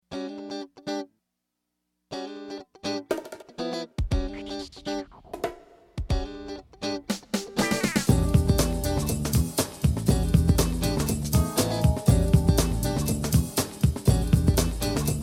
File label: Acid Jazz